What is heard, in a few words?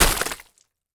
Liquid Mud Dropped Wet Splash Hard